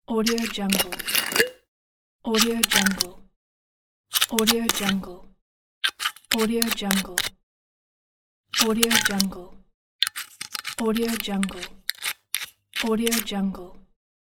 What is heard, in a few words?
glass bottle cap open and close pack